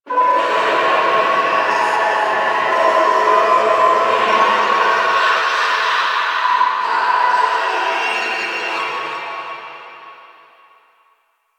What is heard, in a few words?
Infested Distant Sewer Grate Screams; Warframe; Ambience; Nineteen Ninety Nine; DE